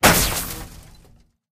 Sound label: Evil Spawner Damage; candy crush saga gamerip